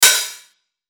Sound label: Hi-Hat; Unison Artist Series; Victor Ruiz "Power Pack" Vol. 1